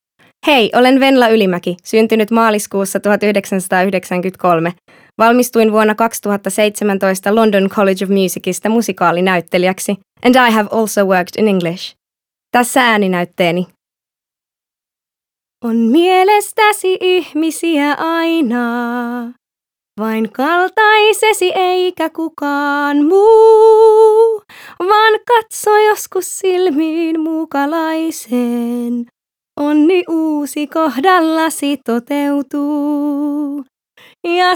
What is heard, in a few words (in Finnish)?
intro ja laulu